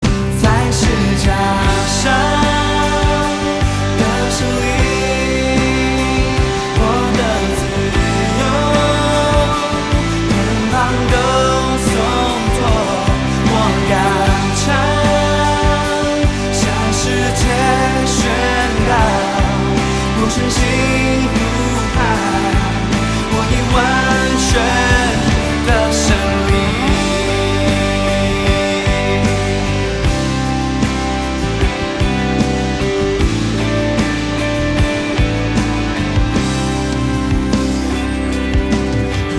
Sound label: MANDARIN